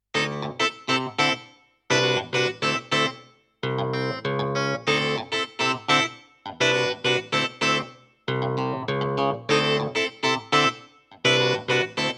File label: Vivo SX-7 Clavinet; Dexibell